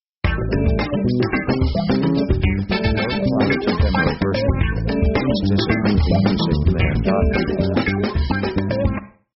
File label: pop 70s funk sketch